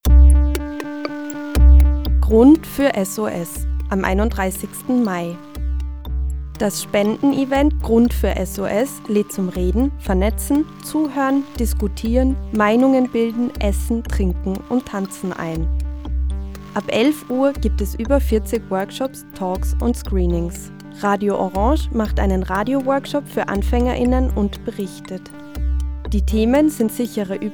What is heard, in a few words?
jingle